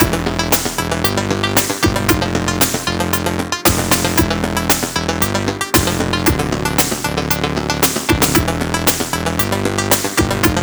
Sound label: Loop; Platforms; The Adventures of Elena Temple